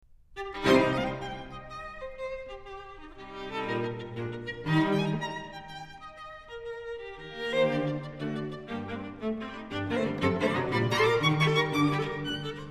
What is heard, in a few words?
String Trio